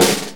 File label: Snare, Snare Drum; Drum Tools